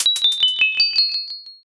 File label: cracker